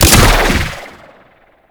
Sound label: Exalted Bow Fire C; Tenno; Ranger